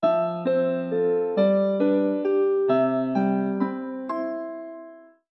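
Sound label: Piano, alarms; ZTE Nubia V70 Max; Nubia Tour Piano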